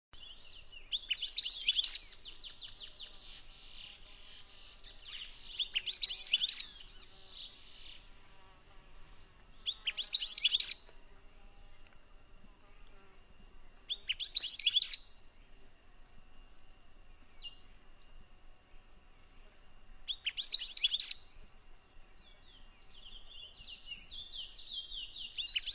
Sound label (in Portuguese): canto do gaturamo miudo